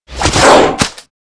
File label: ATTACK JUMP